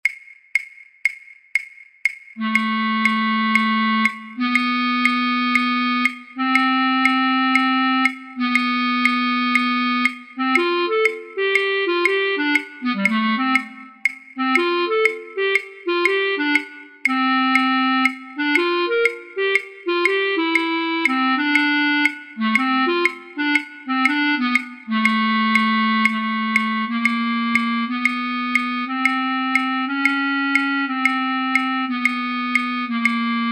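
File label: Bb clarinet trumpet 3; online orchestra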